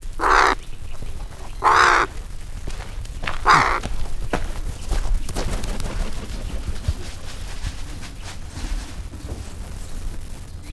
madár elzavarása, dolmányos varjú; miskolci zoo 2009

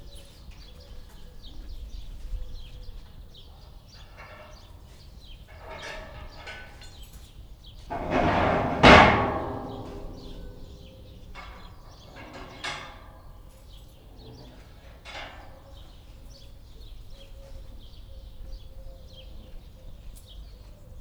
a kifutó rácsának kinyitása, orvosmedve; debreceni zoo 2012; marantz puska

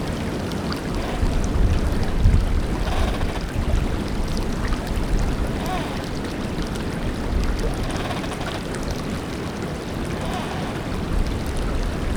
patak, nyikorgás, szellő, távolban szélzúgás, madár, fanyikorgás; Ménes víztározó után

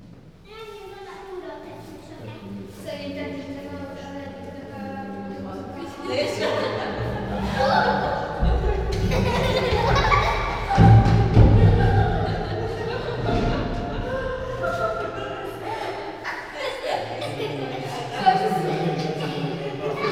nevető látogatók, majomház; allathangok; veszpremizoo 2013

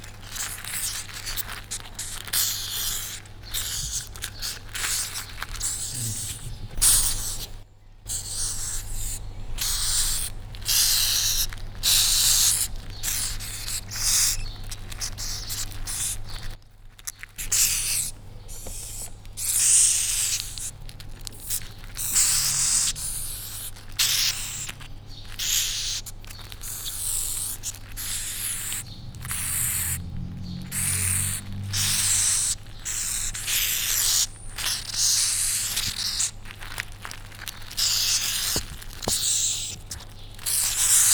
kézben tartott nyolc hím viaskodik egymással szarujuk kopog, madagaszkári bütykös csótány; jaszberenyi zoo 2015

